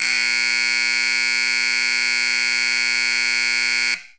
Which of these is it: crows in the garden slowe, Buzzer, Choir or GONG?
Buzzer